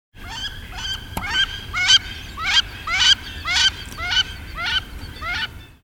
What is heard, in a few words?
Voice Wood Duck